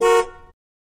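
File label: Cutlass 80 Horn Medium, horns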